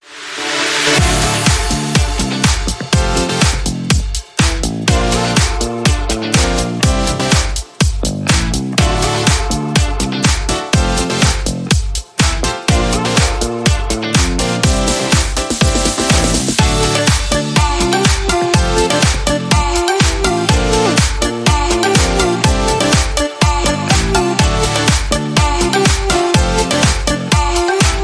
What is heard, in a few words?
No Rap Vocals